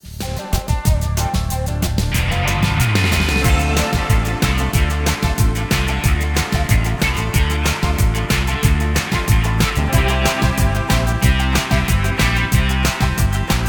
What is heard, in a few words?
instrumental